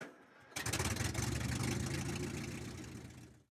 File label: start engine, engines; World of Tanks